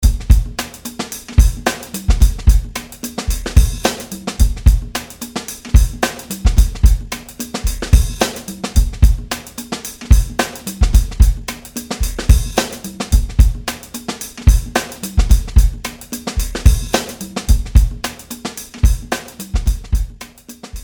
Vintage Funk Kit; Apple GarageBand 2; Apple Loops

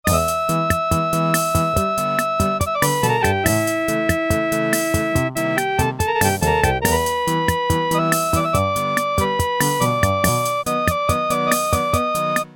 performance, Casio MT-68

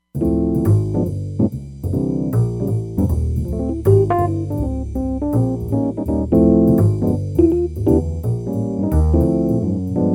Lounge Kit; Roland Fantom XR

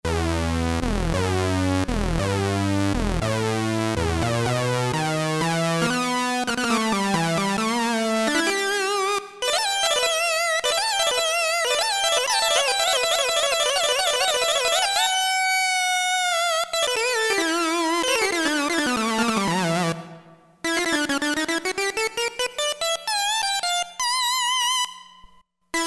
SYNC LEAD, Roland Jupiter-8; Factory patches

